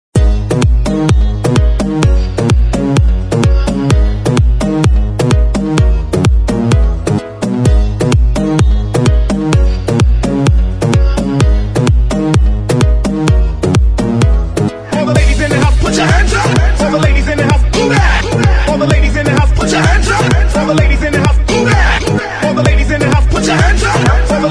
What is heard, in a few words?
Hype intro